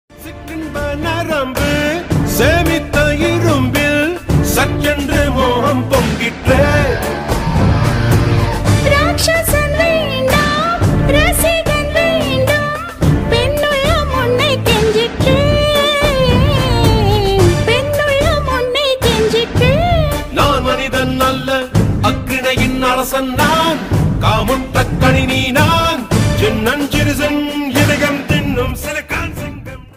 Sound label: tamil ringtone